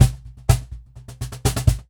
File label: Cajon Fill; musicradar